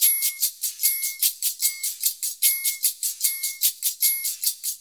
Modern Percussion Loops; Soundscan vol.56; AKAI

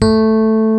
RHODES A4; E-MU Formula 4000 Series Vol. 5; Protozoa

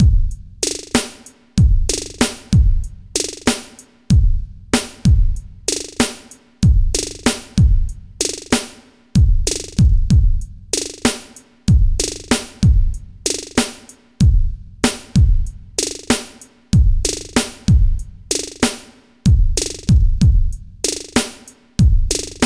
TECHNO, Funky Xtreams; INIS

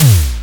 Simmons SDS8

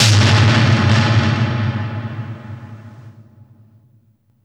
THUNDER TOM, SPACEY DRUMS; Spectrasonic Distorted Reality 1

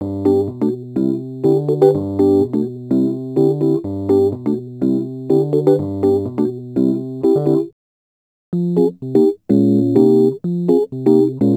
Rhodes Attacks; Ueberschall

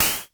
NOIZ, HHAT, Dual Percussion Synthesiser; Klone

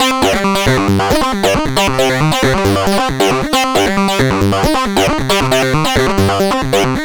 Synth

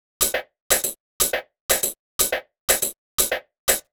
121 bpm hats, combi loops, nu dance electro; Jack In Da Boxx; Zero-G; Soundclash Nu Dance Electro; Acidized Wavs

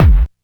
Kick, Drum & Bass; AMG - Prototype Drum & Bass

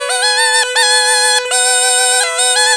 PUNGI

